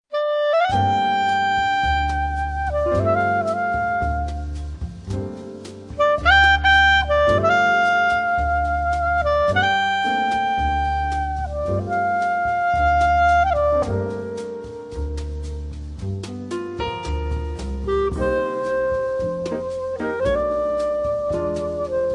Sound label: Swingin The Samba